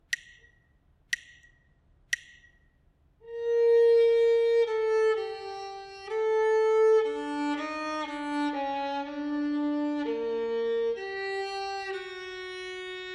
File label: melodic dictation; eartraining